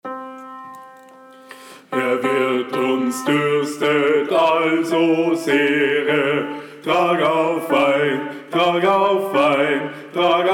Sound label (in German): STIMME 2 links; Gesang; Herr Wirt